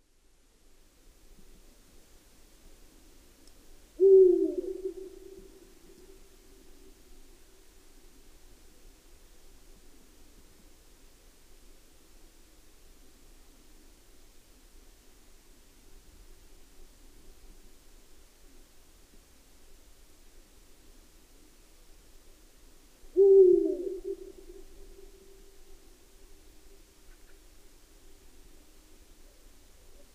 Berguv

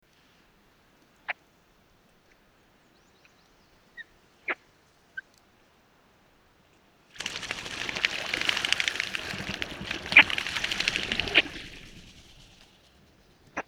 Knölsvärta

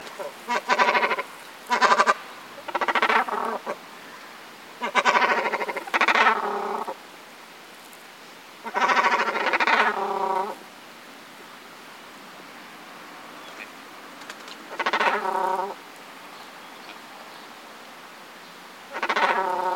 Storskarv

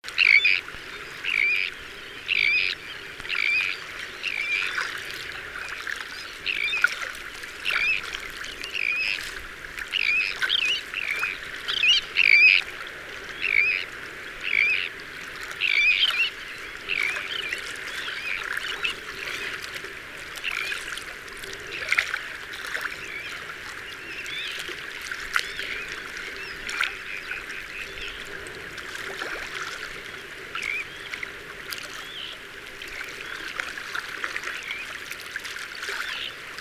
Kenta

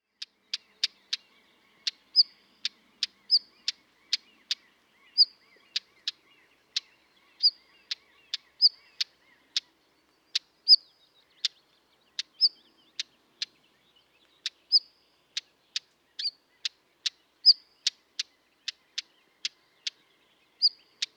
Stenskvatta

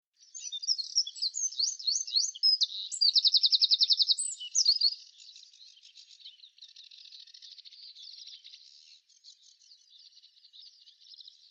Gärdsmyg

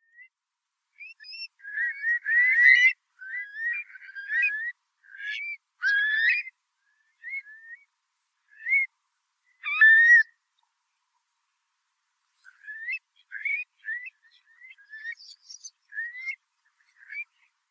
Storspov

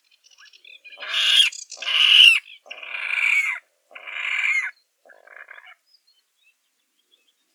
Vattenrall

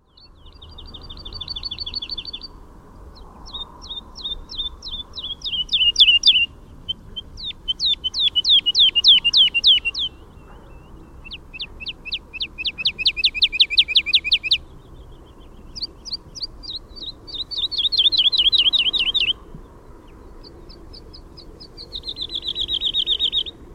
Trädlärka

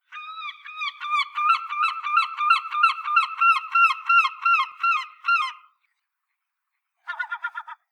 Gråtrut

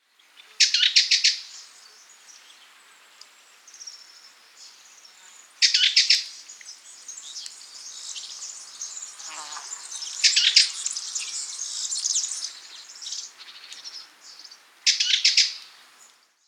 Sammetshätta

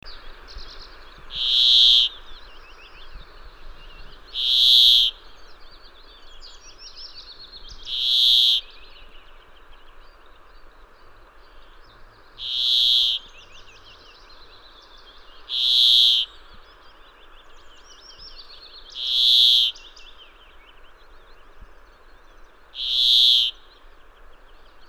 Bergfink

